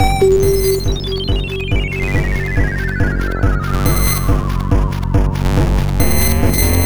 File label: Combo F#m, 140 BPM; CHIPSHOP DELUXE; CHIPSHOP LOOPS